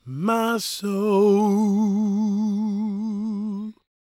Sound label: GOSP MALE, Vocal Planet Gospel